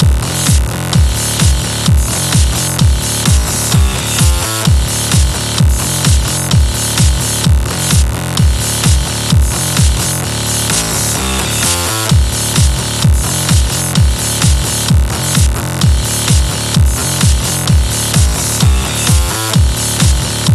Synth

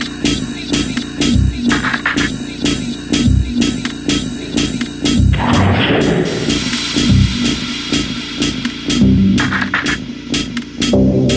Techno Original Mix

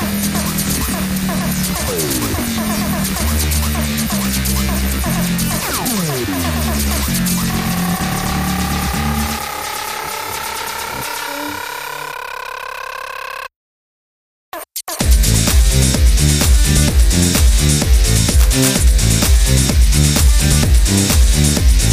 Synth Remix

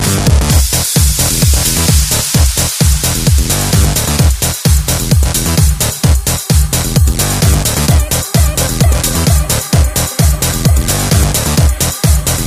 Electro Radio Mix

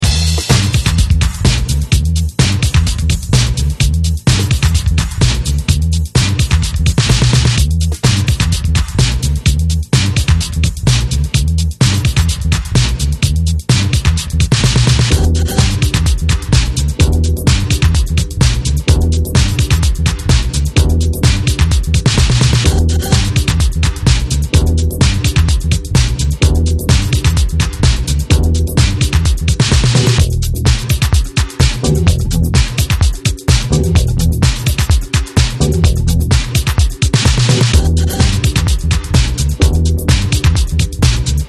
Spacey Disco

